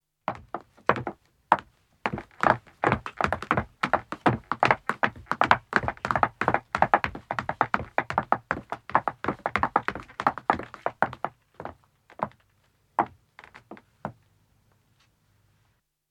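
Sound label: Footstep wood descending, 2 women start and stop; Part of Footsteps (Sound Effects:Human); BBC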